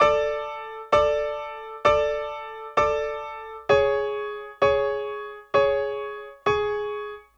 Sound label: KEYB, Hip-Hop & R&B; USB Soundscan vol.47; AKAI